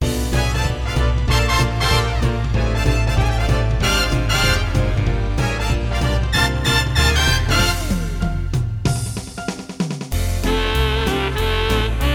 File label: Organ Music; EX-5000; AOW Prelude